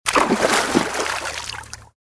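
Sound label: shark sounds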